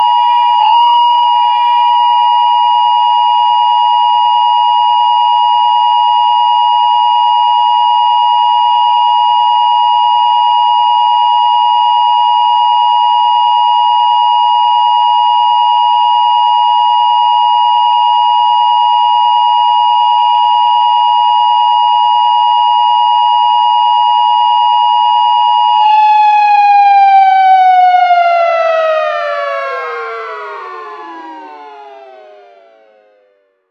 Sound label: emergency electronic outd, electronic